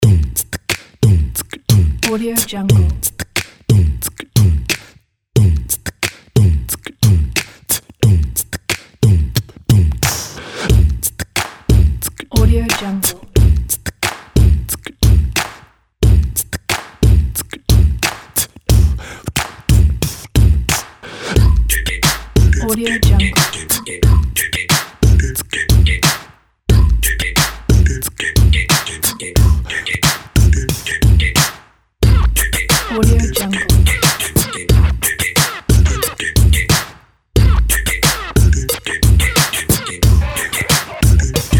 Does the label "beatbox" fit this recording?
Yes